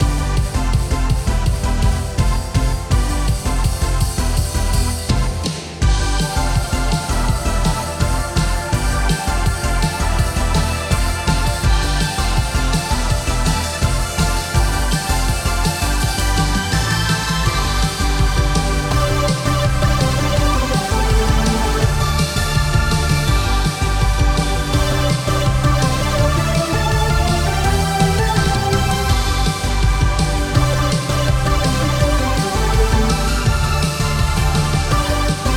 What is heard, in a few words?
sky blue street bpm165